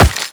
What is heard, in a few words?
Run Rock, Footsteps; Warframe; The New War; Characters; Kahl